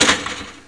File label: SWITCH; AB3DII